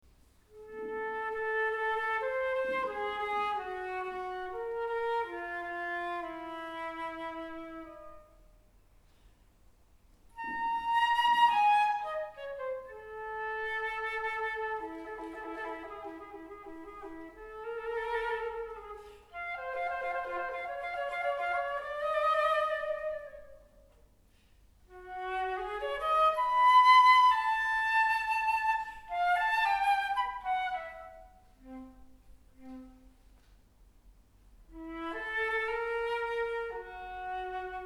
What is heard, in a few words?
flute version; solo for optional woodwind; Wohin